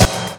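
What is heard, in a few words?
orchestral drum, percussive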